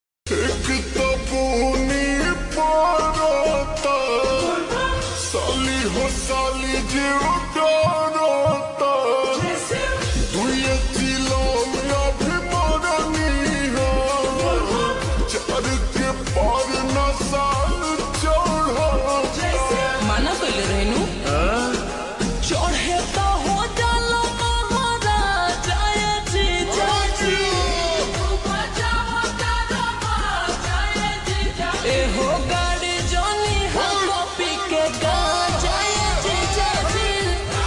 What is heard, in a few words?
Ringtone slowed and reverb